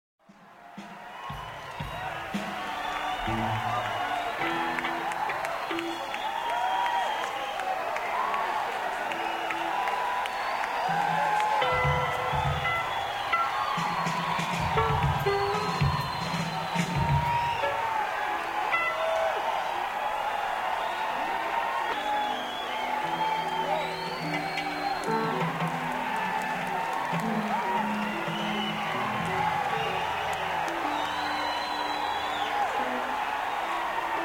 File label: Crowd Tuning